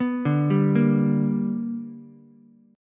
B Minor Major 7th Sharp 5th B, Ukulele; BmM7#5 arpeggiated; 4,2,3,1; JGuitar

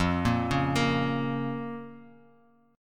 F Suspended 4th Sharp 5th F Bass Standard; arpeggiated; 13,13,11,15; JGuitar

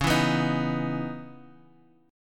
C sharp Minor 9th, Guitar, Open G; 11,9,9,8,x,x

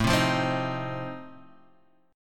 F 7th A

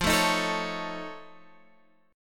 F Augmented 7th, Guitar, Half Step Down

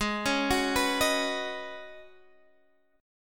C sharp 7th Suspended 2nd Suspended 4th G sharp

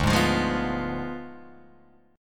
D Diminished 7th, D, down, Guitar Open G

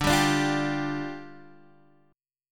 D Minor Double Flat 5th, down, Guitar, DADGAD

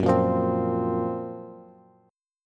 Gb Major Sharp 11th, Bass Bass Standard; GbM#11; strummed; JGuitar

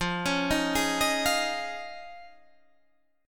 F Minor 7th Sharp 5th, Guitar, Standard; 13,16,13,13,16,13; arpeggiated; capo=3

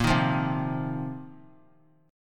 A sharp Suspended 2nd Suspended 4th A sharp; strummed; A#sus2sus4; JGuitar

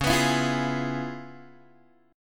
C sharp 7th Sharp 9th Guitar Open G, chord

